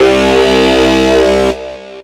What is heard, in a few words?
Metalizer C2